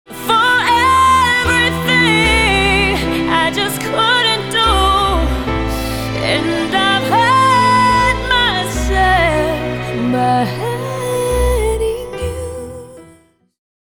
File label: (Call Out Hook)